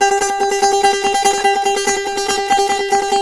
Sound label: SAZ; Spectrasonics; Hans Zimmer Guitars VOL-2